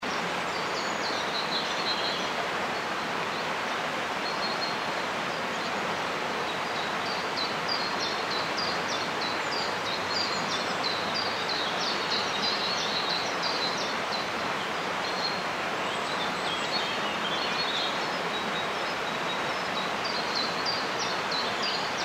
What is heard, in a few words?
forest